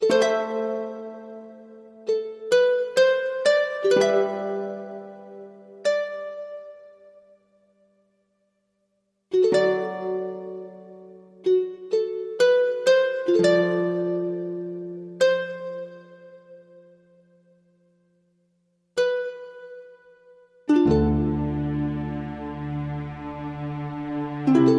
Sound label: Harp Only